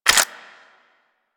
Lex Clip Chamber

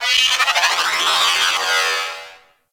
Robot Metallic Textures; Warframe; Venus Open World; Objective Rooms

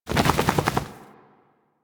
Kubrow Foley Idle Shake; Enemies; Warframe

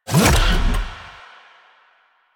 Bullet Jump Land, Motorcycle; Motorcycle Ninety Nine

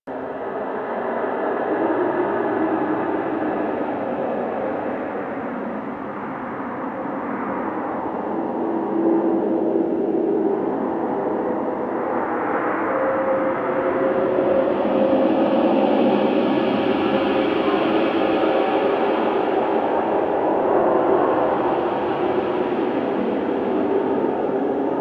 Mountain Pass Cave Entrance Amb Loop; Ambience; Warframe; The War Within

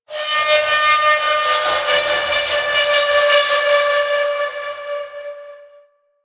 Asteroid Metal Groans And Scrapes; Ambience; Warframe; Grineer Asteroid

